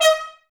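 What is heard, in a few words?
brass trumpets D#; Miroslav Vitous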